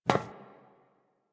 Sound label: NPC Step Default, Footsteps